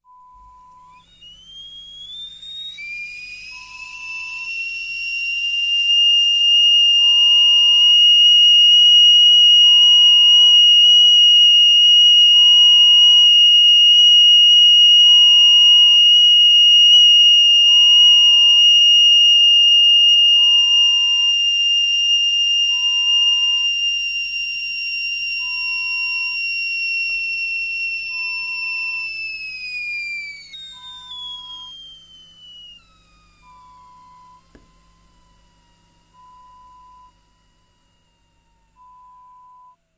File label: Whistling Kettle